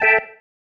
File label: Stab, Organ, One Shots